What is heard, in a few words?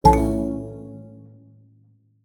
Ball, phonetones notifications; Nokia; X